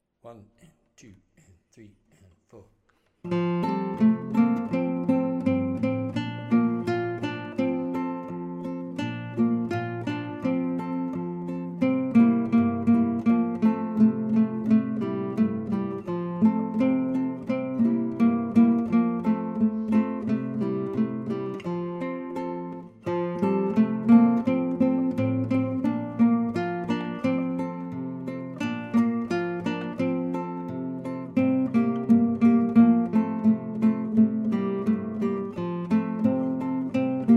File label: in F, guitar; Song of the Wind